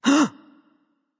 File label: nv breath; smite; awilix